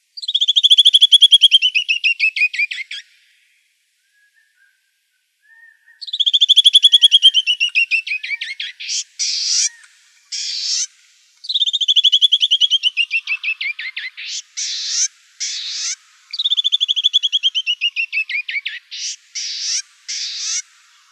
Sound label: Wrens; CNWR; deviche